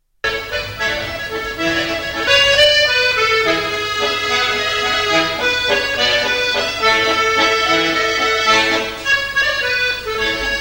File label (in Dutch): Wals uit Midden Brabant - Belgique